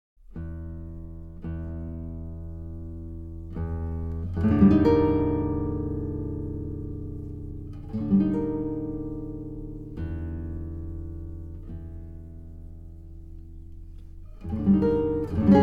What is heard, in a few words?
folk song settings interlude